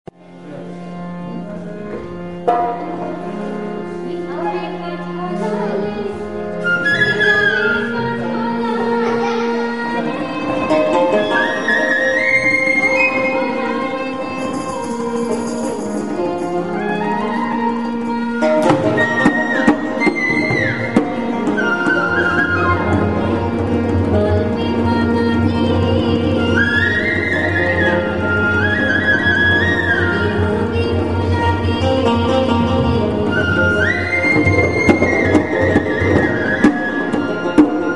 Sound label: bihu tune, Field Recordings from India; WFMU